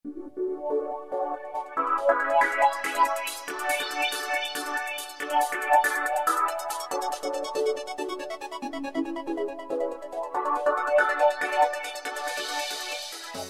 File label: ambient